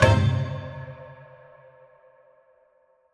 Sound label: Spinbutton; netent